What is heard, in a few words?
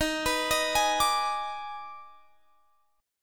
D sharp Major 7th Suspended 4th Sharp 5th, D sharp, down